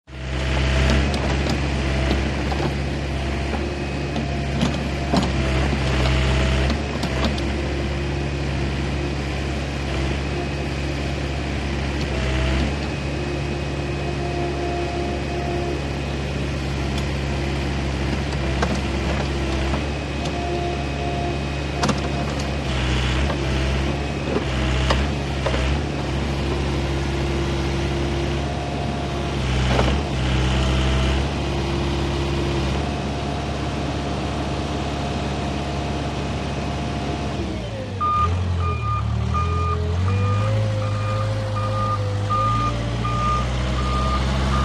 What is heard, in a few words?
VEHICLE - CONSTRUCTION JOHN DEERE 750: EXT: Digging maneuver, idle.; 750 Dozer